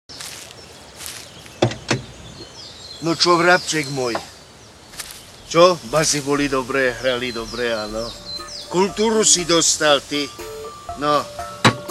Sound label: Instrumental; BratislavaFilm2009; Instrumental cislo 2